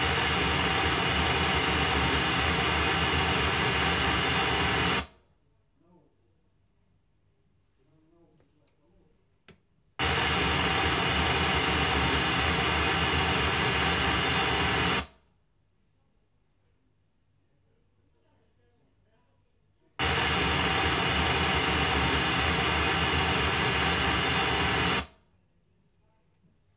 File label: pink noise isolation; kabina; diktorskie kabiny